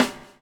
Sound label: BUBL, BUBBLE; Spectrasonics - Backbeat